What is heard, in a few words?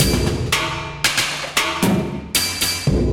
Doom Drums Kit 115 bpm; MIDI Clips; Ableton; Mood Reel